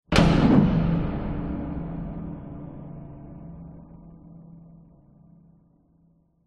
sound design cinematic hit metallic hard with whoosh; zapsplat